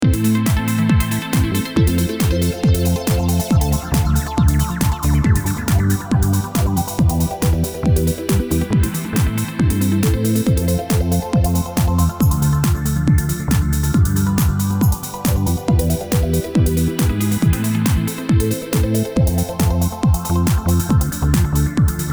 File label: Jingle